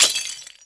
Vase; Submarine

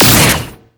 Shoot; TreeRex

